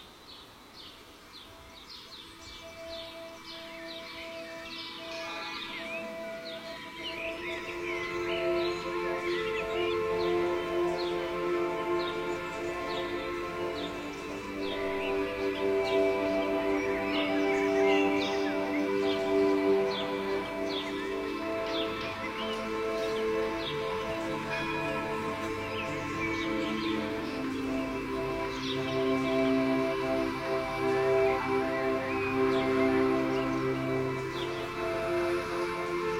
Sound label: loop